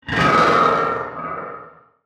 FRACTAL GUARDIAN death; Legacy

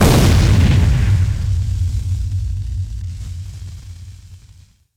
Incendium Grenade; Weapons

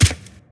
Footstep Mudraptor; Footsteps, Monsters

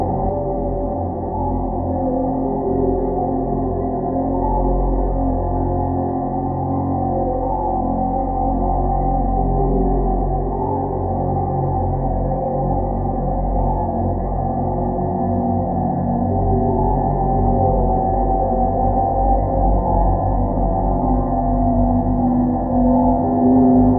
artifact Holder Loop; Items; Alien

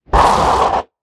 attack; Barotrauma; Crawlerbroodmother

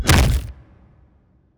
Hit Armor; Damage

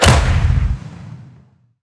WEAPON harpoon Coil Rifle Shot; Weapons

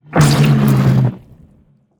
spineling giant attack projectile

